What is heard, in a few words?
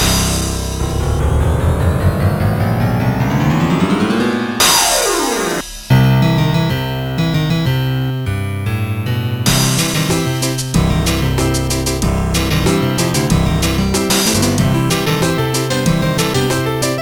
piano potpourri; Trackers; Modland